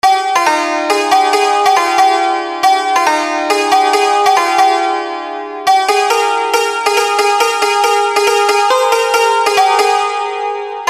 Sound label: santoor